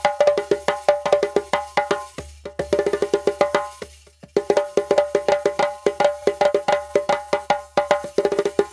Djembe with Kessing, African

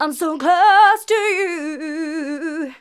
Female vocals and robo-vox

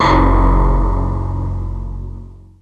PPG WAVE, OLD SYNTHS

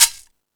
Latin Layers, Drum Machines E-MU Pro-Cussion

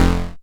Bass, Synths, 5U Modular

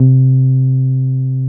TB 303

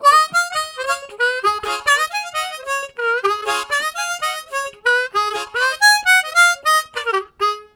Rond and Rond, Harmonica Riffs; Zero G - Harmonica

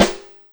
OLD, Yamaha DTXpress Acoustic Snare

